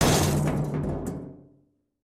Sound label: collide, vehicles; aronwk collection; ndaudio